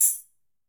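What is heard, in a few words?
Tamborim Shake, latin percussion; Drums; Drum Hits; Tambourin; Ableton; Factory Packs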